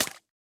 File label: Water Gun Shoot; Going Under